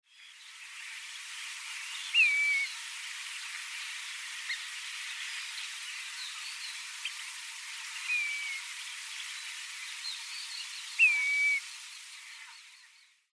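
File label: Grey Plover; sanbanse; koe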